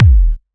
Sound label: Kick, Drum Hits; Zero-G Trance Inducer; 90 s Sample CDs